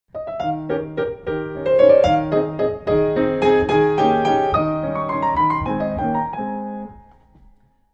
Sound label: Waltz; Schubert; op9no1